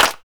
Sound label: DIRT CLAP; Drums In Your FACE!; CLAPS